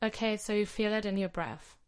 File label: breath; feeling and reflecting on anger